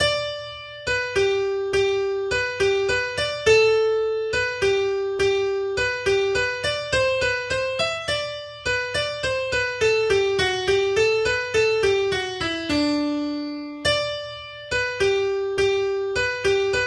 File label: slow Slangpolska